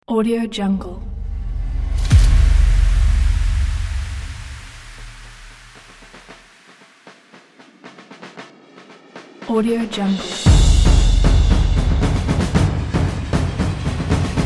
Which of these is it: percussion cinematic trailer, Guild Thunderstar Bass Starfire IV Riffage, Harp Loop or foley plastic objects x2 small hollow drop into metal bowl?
percussion cinematic trailer